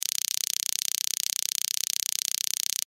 Police Taser, Police Taser Electrical Shock Buzz Zap; Emergency; Police Taser Electrical Shock Buzz Zap Taster; Zap Sound Effects